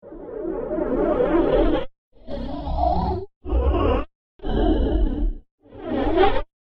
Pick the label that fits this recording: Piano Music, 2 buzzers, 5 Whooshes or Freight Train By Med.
5 Whooshes